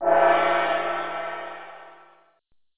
gong

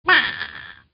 Witch baa

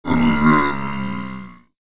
robot scream

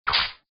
sword swing

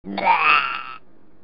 lizard throw

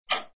LEVER fade out

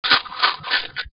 munch

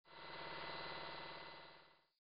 Generator

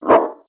bark dog

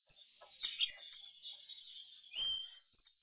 tropical birds fade out

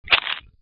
egg crack

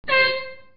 horn sport

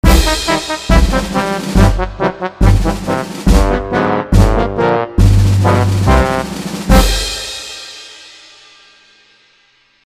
Frantic short

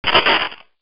electricity break

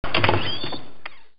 open door

